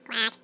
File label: duck